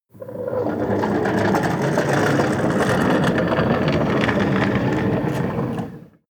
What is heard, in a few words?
slide wooden door